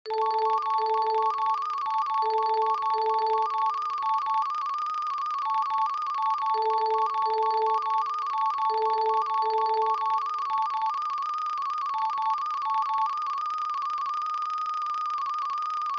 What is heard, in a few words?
geometric series scale; tunesmithy